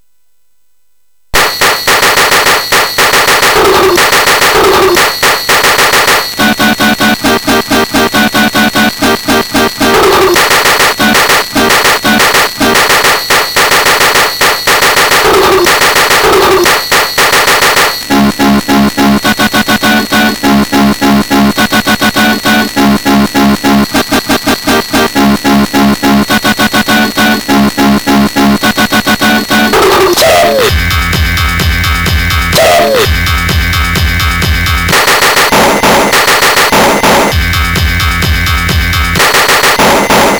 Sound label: Computersound, MOS6581R3; hvsc